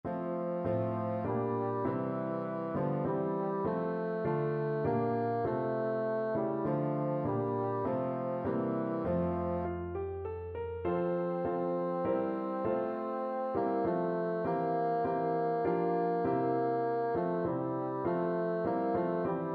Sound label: bassoon